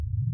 slip start, Train; OpenBVE; 55022 Royal Scots Grey

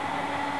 motor, train; OpenBVE

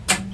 leave, train; R110B; OpenBVE

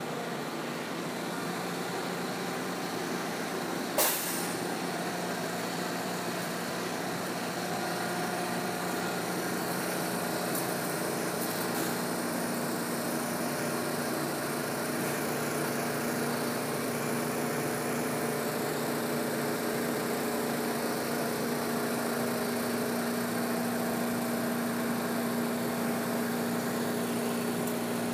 cp loop, R142; OpenBVE; Jerome Yard

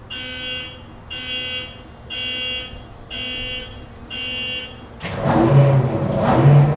starter on, train, class 66; OpenBVE

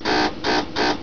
Adjust; OpenBVE

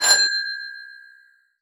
Klaxon, Train; OpenBVE

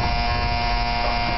Klaxon, Train; OpenBVE

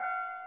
Crossing; OpenBVE; Iida

